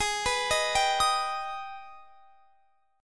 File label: G sharp Minor Major 7th G sharp down; G#mM7 arpeggiated